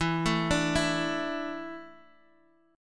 E 7th Suspended 4th

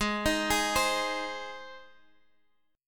G sharp Major

